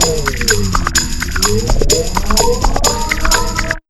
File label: 126 PERC, PERC LOOP, Fresh Disco House; USB Soundscan vol.20 - Fresh Disco House I